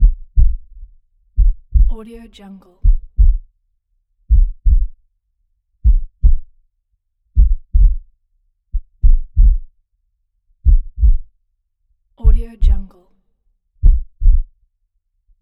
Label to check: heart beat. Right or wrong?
Right